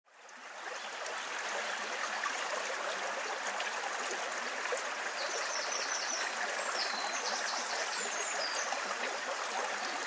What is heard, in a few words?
river; ballynahone